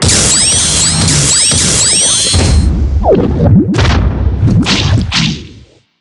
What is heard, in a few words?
Boss Dead; Super Monkey Ball Banana Blitz HD